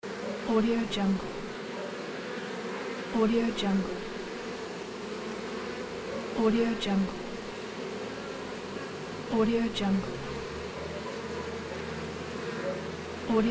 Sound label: bees apiary